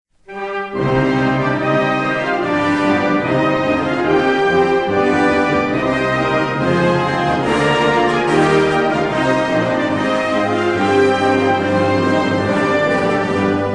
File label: Traditional Dutch Cavalry March; Mars van Aerdenburgh